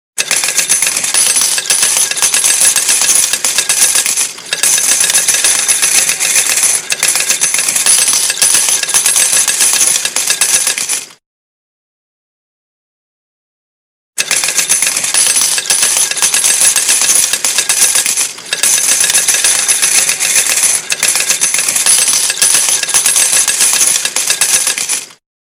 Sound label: Jackpot; ringtones; Las Vegas